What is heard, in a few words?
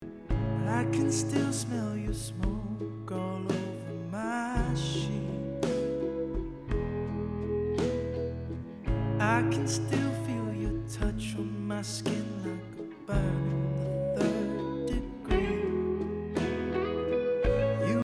Room; SeventhHeaven